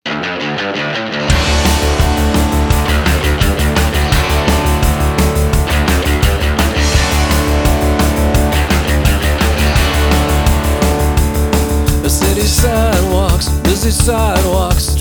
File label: bells